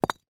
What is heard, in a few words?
impact brick hit ground